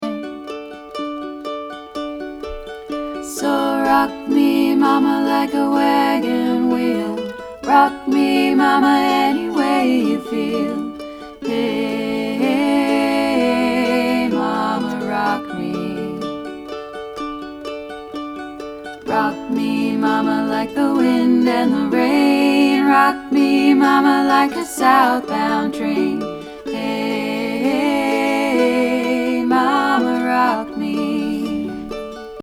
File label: chorus harmonies